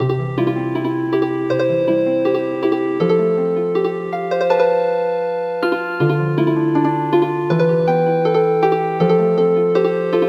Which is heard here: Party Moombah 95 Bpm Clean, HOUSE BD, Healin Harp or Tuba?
Healin Harp